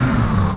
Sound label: scan; 1997 MMX LAN PACK (win 95)